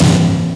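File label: big crunch; mk2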